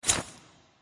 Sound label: Click Empty gem; The Great Ottomans Strategy Battle for Throne; android